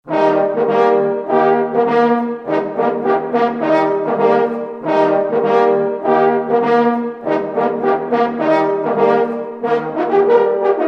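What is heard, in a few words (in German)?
REH TOT Jagdhornbläser; Latsch; Schworz Wond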